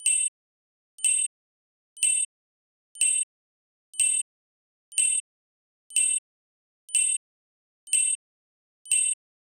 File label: Bomb Timer Beeps Count; Clocks, Watches and Timers; Blastwave